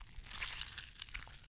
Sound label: Blood Squish